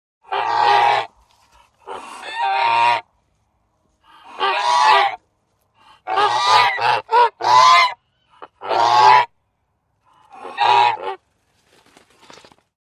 Goose Honks Strange

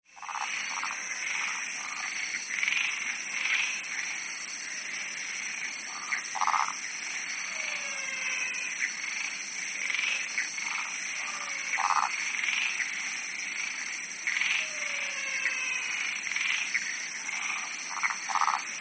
Frogs Bleats Pond Ful

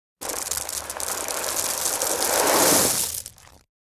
Sand Rock Gravel Pour, rock exterior

